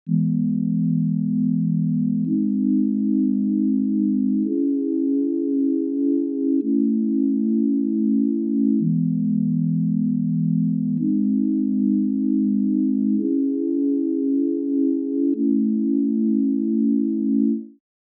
PAD, 110BPM Emaj; 99Sounds; Monosounds; Music Loops; PANDORA BOX!

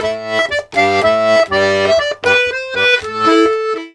Accordions, 120 laride

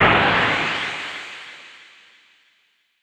Swept Noise

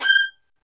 STR, Roland String Master Series, Vls Marc

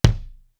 DEEP, TOM; East West - Global Sounds; D4 BD SD TOM

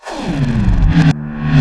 REV, SOUND EFFECT REVERSE

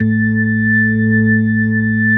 A2, B3

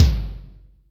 Acoustic Tom; Yamaha DTXpress; drum machine

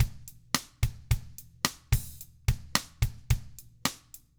109 POP

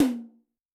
Amb Tom H, Yamaha DTXpress-II

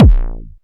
BD DIST, Korg EMX-1, Drum Machines

